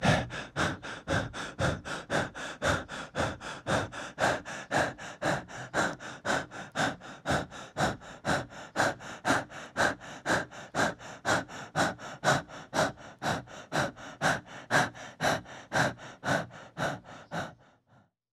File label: fast breath sounds